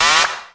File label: klaxon single